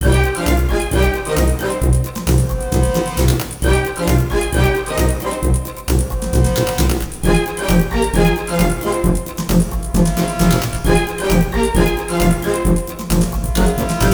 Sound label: Motorbike, Music